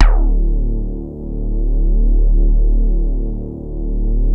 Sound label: CAT HARD D2, DIGITAL BASS; Music Store - Claudius Diemeter Schwerlos